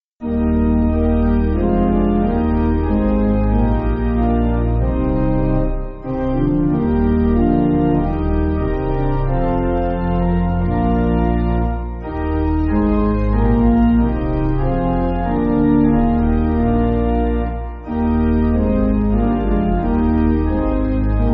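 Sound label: Pipe, Pipe Organ, Backing Files for Churches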